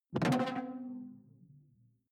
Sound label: sci-fi alien bounce detuned; swooshes-swishes